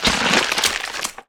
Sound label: behemoth gore; factorio 2.0.55